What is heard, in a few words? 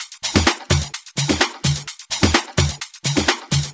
Minimal Loops